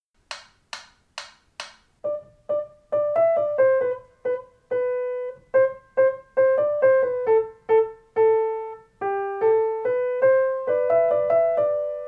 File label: Primo PLAYALONG; Lasst uns froh